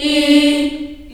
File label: D3, Choir, CHILD; CHILD IHS